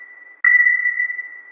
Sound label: Asdic Single Ping